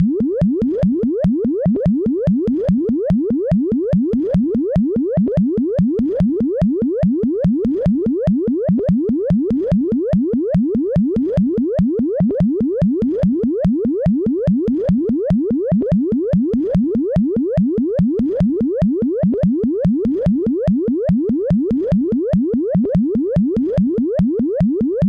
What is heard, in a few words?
techno